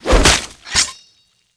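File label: Seidwarrior attack; Seer; GamigoNA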